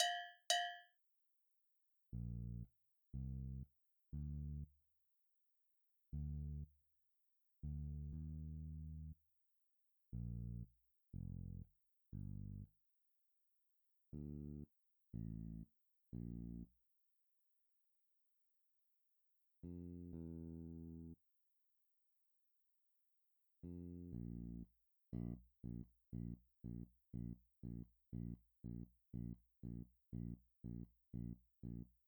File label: Bass Guitar Classical